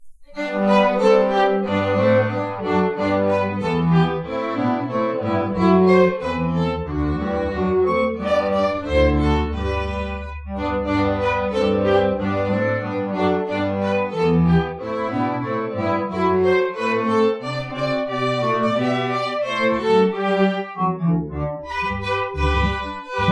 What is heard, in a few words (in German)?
streicher jingle bells